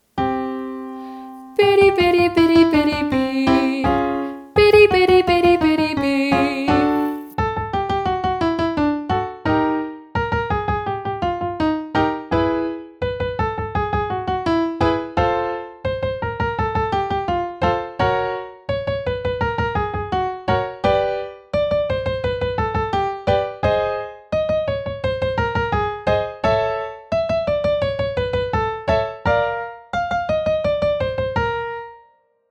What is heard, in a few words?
biddy bee ascending, vocal exercises